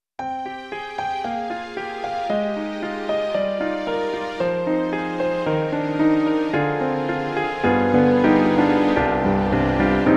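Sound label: Roland SonicCell Grand Hall, piano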